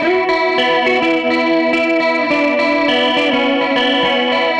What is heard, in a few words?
Gretsch Guitar Loop